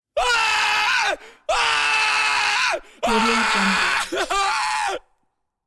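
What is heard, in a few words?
group trauma scream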